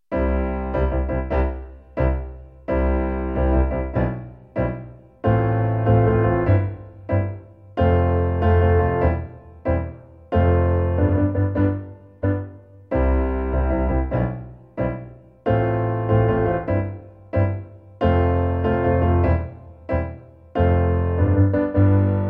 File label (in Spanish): PIANO